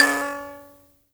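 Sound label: buzz kalimba C#4; Heart of Africa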